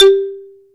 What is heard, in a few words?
XYLO G 2; AKAI S-Series CD-ROM Sound Library VOL-7